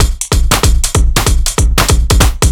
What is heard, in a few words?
Heavy Swing 95 C, 95bpm; off the grid; Kit 4